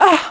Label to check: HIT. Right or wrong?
Right